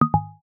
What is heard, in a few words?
voice earcon error